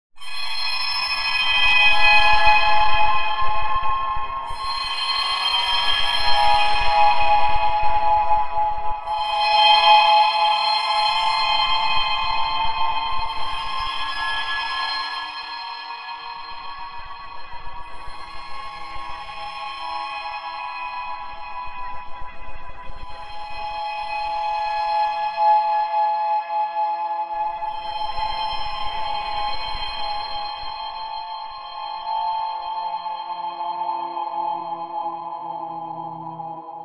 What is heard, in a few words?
tone